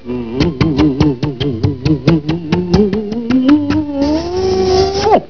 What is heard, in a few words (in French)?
bisous